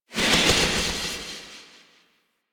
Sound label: Impale Cast Release Tail; Warframe; Warframes; Hoplite